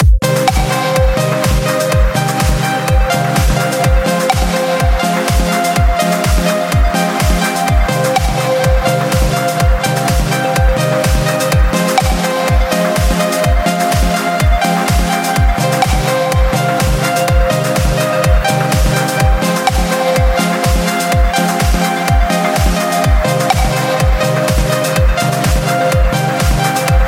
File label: House Music